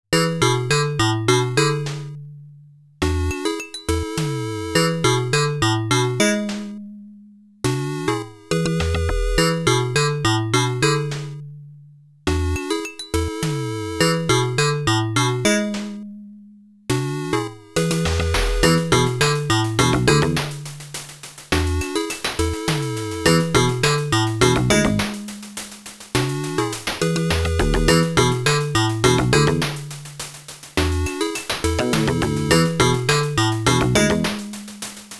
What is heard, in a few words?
MEC PC-8081mk2SR Version, 8bit; Gate 変移空間のオクテット; 8bit Original Soundtracks